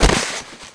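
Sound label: player bodyfall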